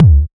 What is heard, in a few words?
deep kick d key, kick drum single shot tuned to the D note. Loudest frequency: 147Hz